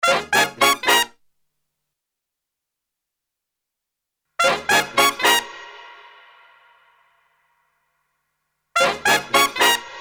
brass

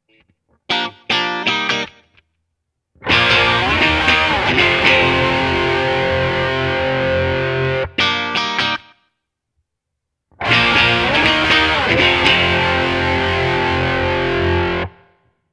Rhythm Distortion

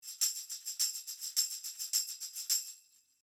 Shure SM58 Tambourin 1 Meter Behind 180

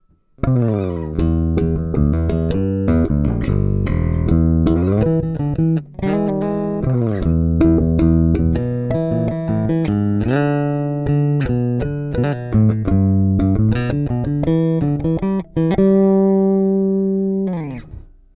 Bridge active neutral

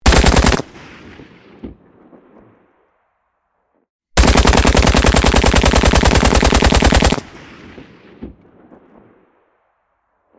Guns AK47

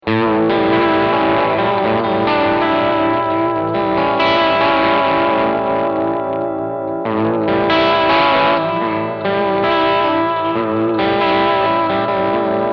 Vibrato Vintage

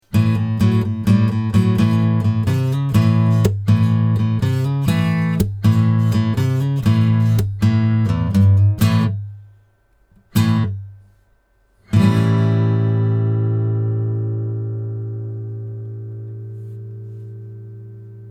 Blues

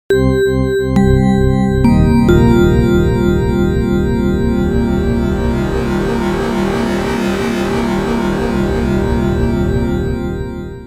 wavetable

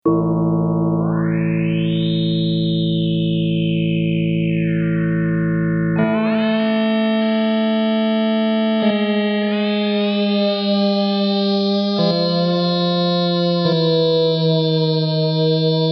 Hammond XK 4 MFX1 Ringmodulator

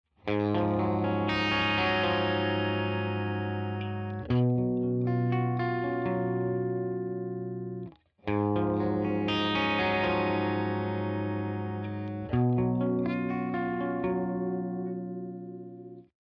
Riff clean

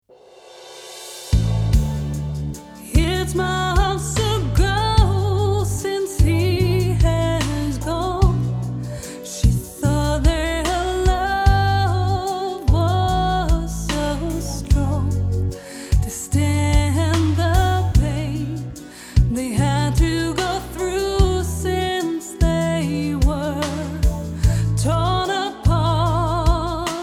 Reverb Lexicon 200; Lexicon 300

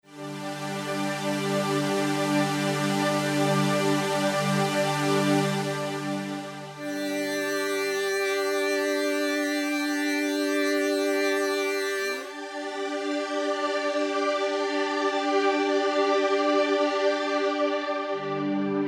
retro pad

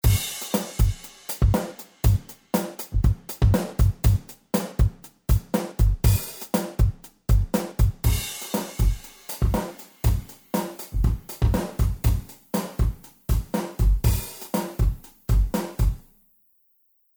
air verb room

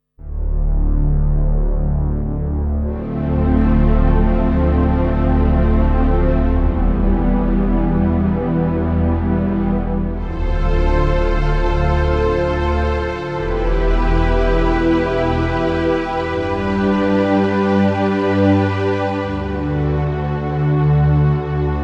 Analog Pad

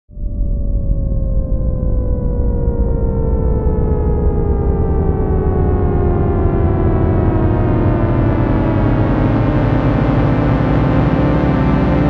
16 Beat Glider; Gforce Oddity

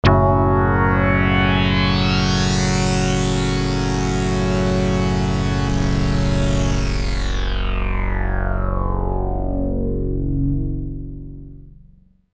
sweep; P600 gligli

